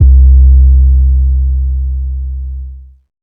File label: 808's